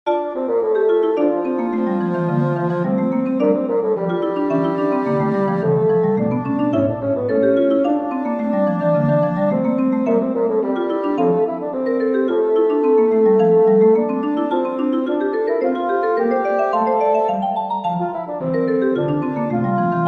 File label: prelude for bassoon marimba cello; Johann Sebastian Bach; vom himmel kam der engel schar